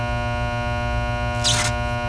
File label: sparking transformer